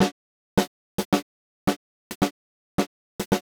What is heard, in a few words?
Snare Gate HPF LPF